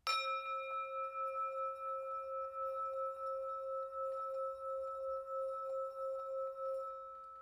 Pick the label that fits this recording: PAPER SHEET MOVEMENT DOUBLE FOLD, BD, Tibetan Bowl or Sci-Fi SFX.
Tibetan Bowl